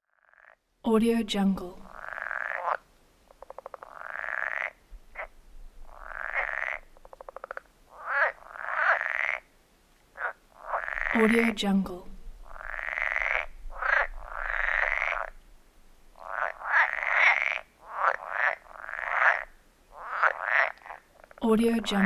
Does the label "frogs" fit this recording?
Yes